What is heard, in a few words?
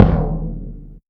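bass drum, right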